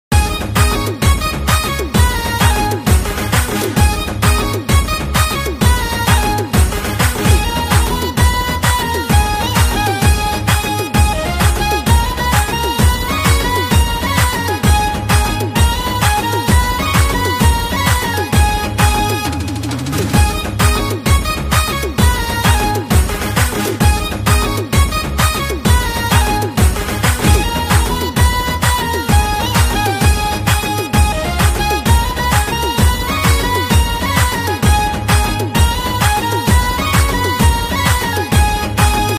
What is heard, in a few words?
ringtone bgm; raja sankranti